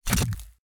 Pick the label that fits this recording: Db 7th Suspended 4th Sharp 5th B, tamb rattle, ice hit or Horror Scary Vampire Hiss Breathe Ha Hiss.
ice hit